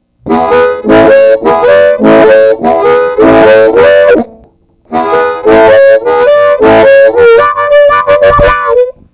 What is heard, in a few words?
honker clean, harmonica